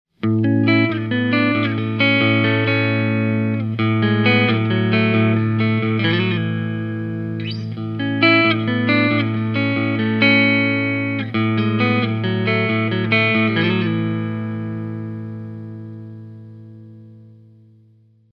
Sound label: clean split, humbuckers; origin plus